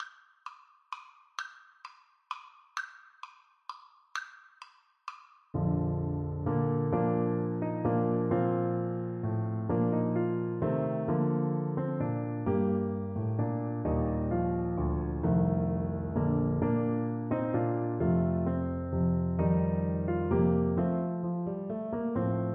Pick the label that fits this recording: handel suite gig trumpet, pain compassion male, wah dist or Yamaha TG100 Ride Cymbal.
handel suite gig trumpet